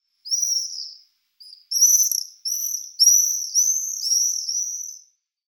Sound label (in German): Mauersegler Apus apus